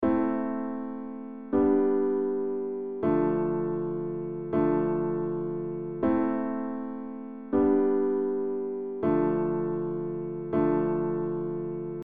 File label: Im VII IVm IVm